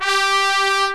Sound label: POP BRASS